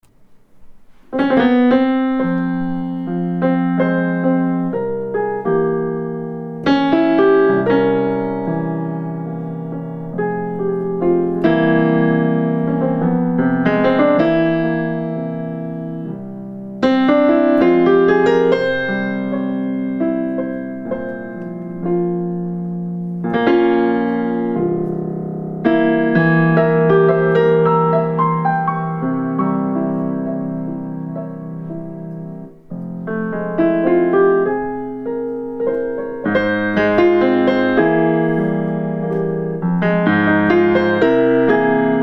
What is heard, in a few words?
Raining, piano